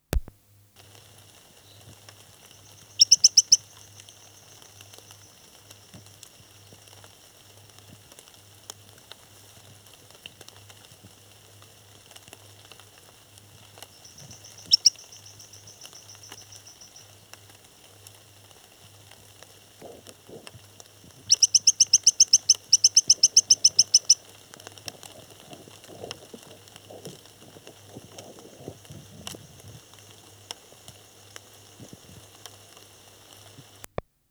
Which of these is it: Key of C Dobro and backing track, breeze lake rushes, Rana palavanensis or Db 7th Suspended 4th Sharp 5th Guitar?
Rana palavanensis